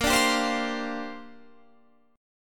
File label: Bb 6th Add 9th Bb Guitar Drop D